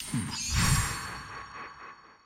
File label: sticky scatter; druids dream